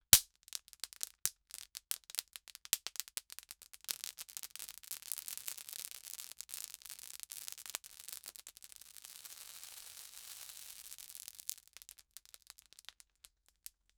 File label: several popsicle wrappers, crinkling